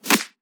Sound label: Claps & Snares